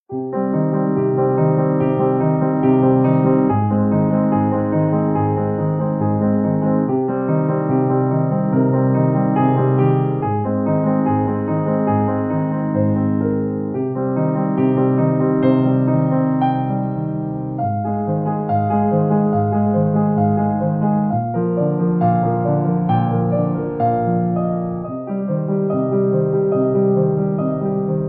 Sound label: piano arpegios